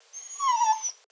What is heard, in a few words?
biphonic dog